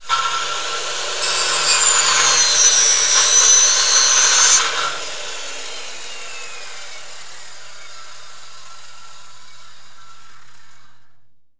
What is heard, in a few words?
POWERSAW